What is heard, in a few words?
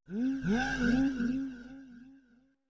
foliages on wood; PreyLoot